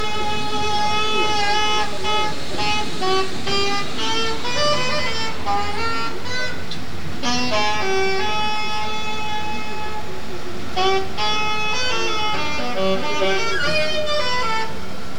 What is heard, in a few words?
field recording drunk saxophone